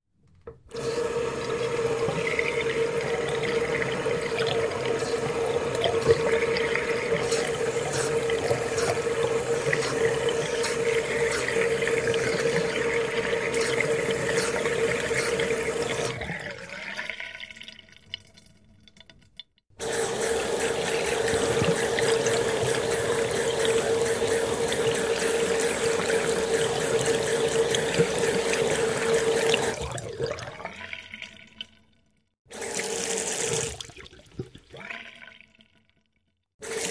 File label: Wash Basin Glass x4